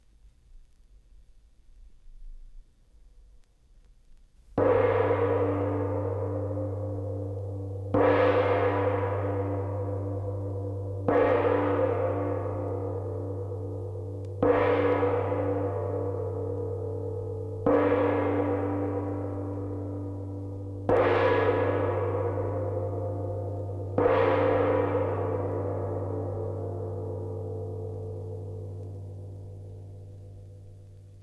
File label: Gong Seven Strokes; Jac Holzman; Authentic Sound Effects Volume 2